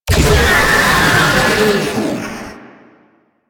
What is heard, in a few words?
Pain, Vocals; Warframe; Proto Infested; Babau